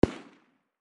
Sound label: mac, distant sound; garrysmod